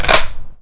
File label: ssg bolt back; firearms